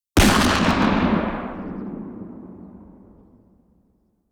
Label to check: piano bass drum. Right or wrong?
Wrong